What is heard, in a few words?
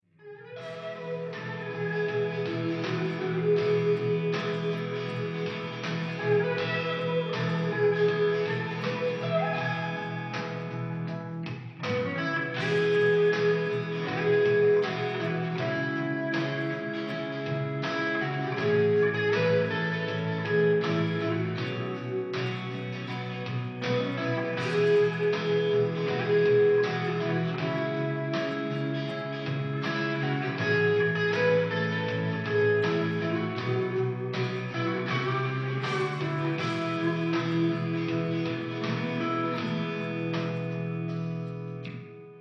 Rock; Usen; Fist of the North Star Lost Paradise; Hokuto ga Gotoku; PlayStation 4 gamerip